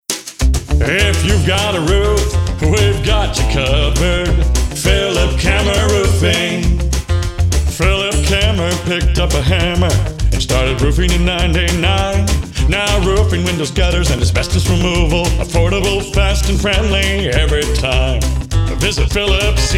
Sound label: Full Sing; Phillip Camerer Roofing; Jingles Joplin MO